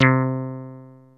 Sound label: Bass Synths hits and octaves